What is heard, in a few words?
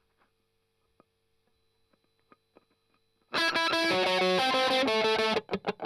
Strum Octav